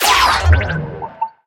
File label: incubator birth; darwinia demo